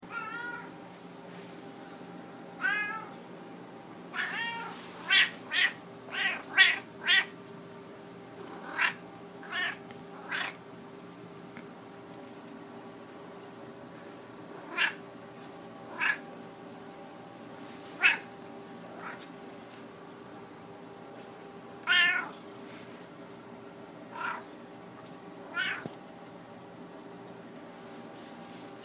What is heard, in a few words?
meowing; yoders